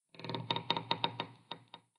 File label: wood creak wood creak